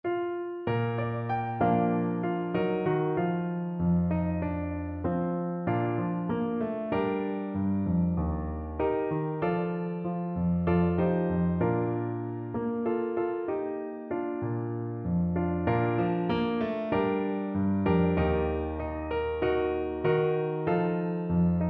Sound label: Clarinet